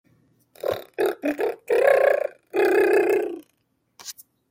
sad vibration

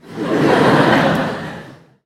laugh

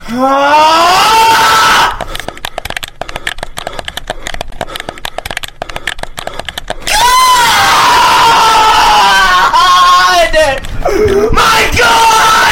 hard aughhh

